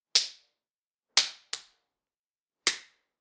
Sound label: Slapping Bare Skin